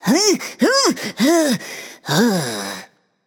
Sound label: breath; cupid